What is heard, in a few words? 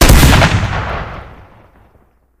backshot shotty; tf